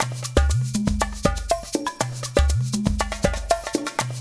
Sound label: LOOP, Percussion Slam; InVision Interactive